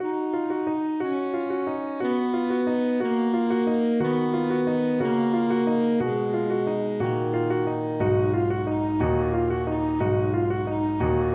saxophone

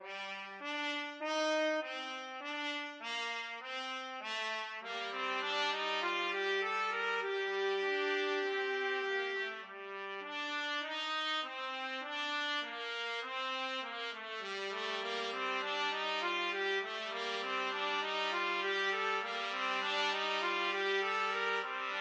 submarine warfare trumpet duet

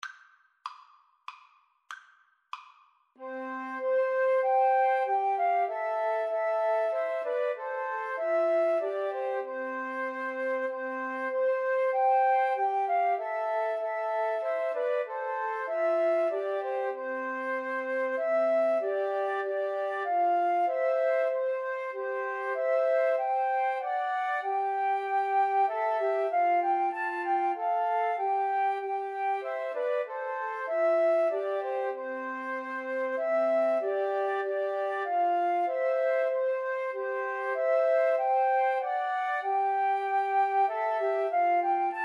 Christmas Hymn FL trio, Flute Trio

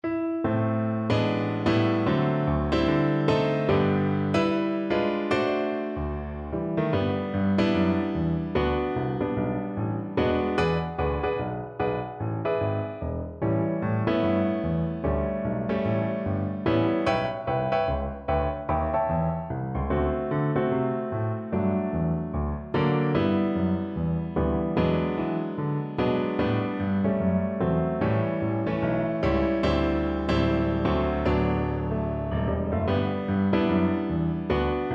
aliceblue viola

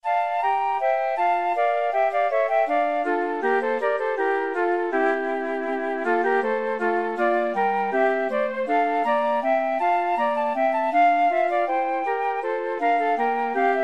fl fl cl, 2 flutes clarinet

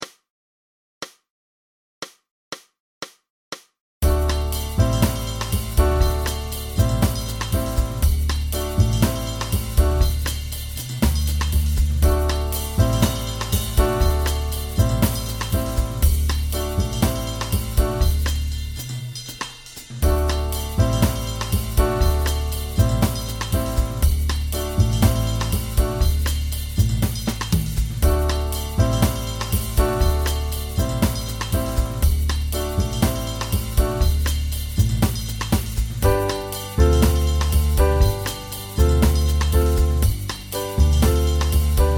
black pepper samba, guitar

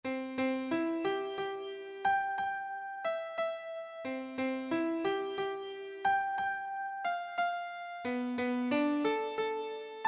blue danube keyboard

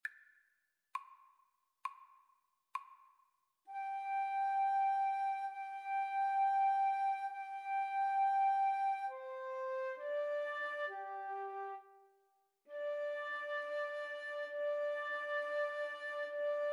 pastorale, Flute duet

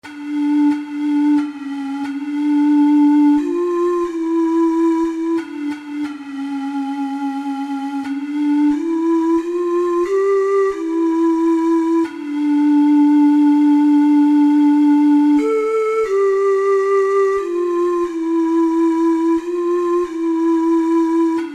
coventry, ocarina four hole

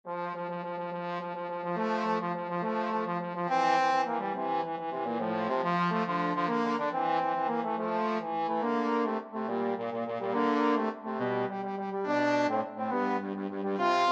trombone duet

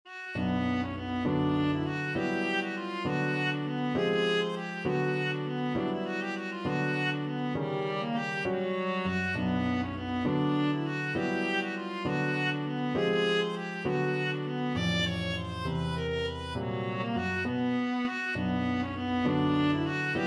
viola

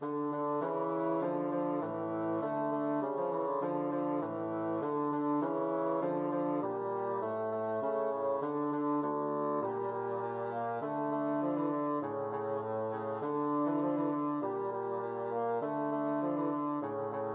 bassoon duet; Sivivon

